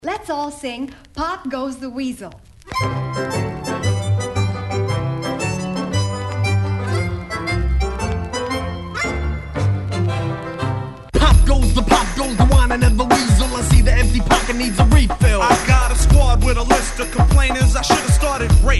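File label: hip hop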